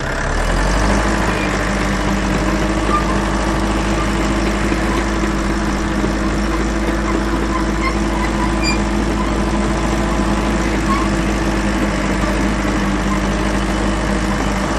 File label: Bulldozer Slw Mvmt Up